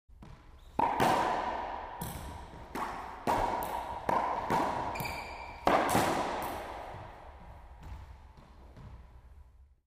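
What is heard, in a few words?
tennis ball hit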